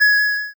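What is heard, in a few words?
diamond burst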